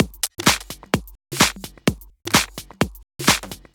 Loop, 128 BPM

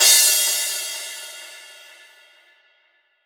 Crash, Cymbals

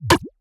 Percussive; Percussion; Mutekki Media; Vengeance Electroshock Vol.2

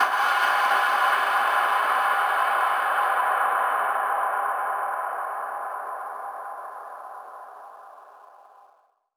FX Impact

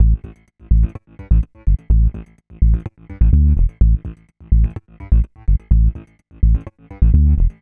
126 A BASS, Funky Underground bass loops; Loopmasters; Funky Underground Vol 2

